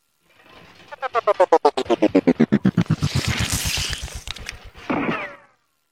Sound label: Shot